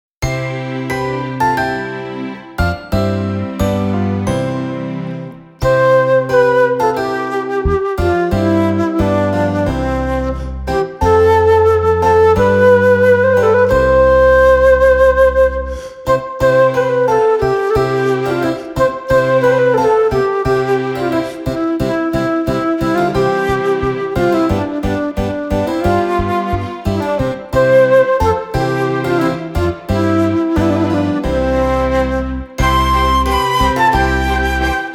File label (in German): Instrumental; Freue dich Welt